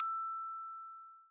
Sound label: E6 bell